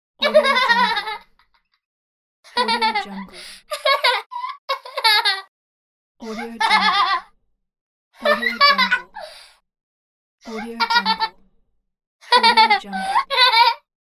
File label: kid giggling